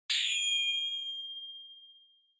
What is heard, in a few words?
Flash Low Long; Onoma; オノマトペ 光る; 低 長